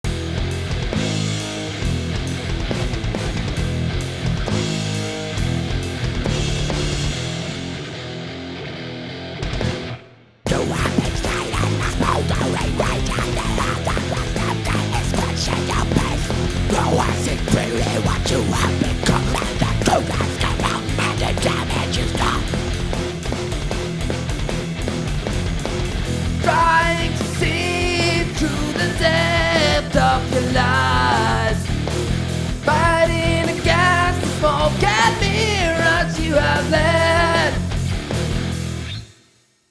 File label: thrash